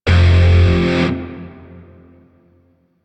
Shawzin Chromatic Chord; Grineer; DE; Warframe

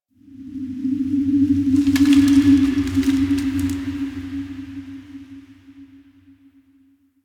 Park Wind; Ambience; Warframe; The New War; Quests; Zariman

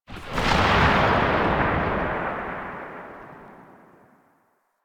Gunbrella Alt Tail, Weapons; Warframe; Tenno; Koumei

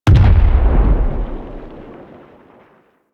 Golem Step Far; Warframe; GolemRemaster; Plains; Footsteps

